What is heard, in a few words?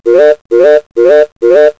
confirm; TIMECARD